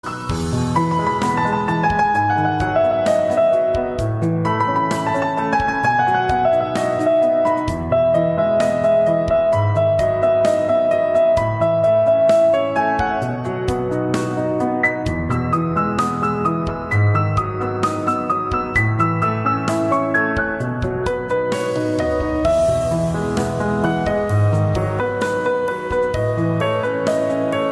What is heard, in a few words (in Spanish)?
bebe piano